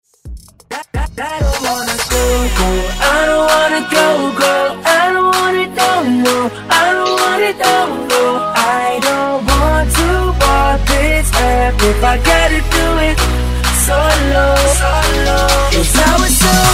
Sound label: Epic Intro Redrum